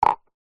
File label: household cup plastic empty set down table; zapsplat